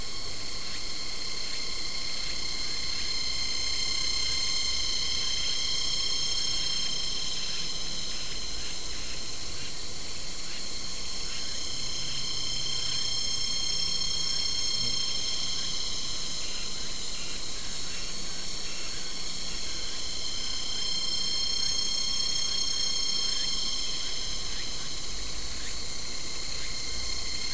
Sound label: mainly insect cluster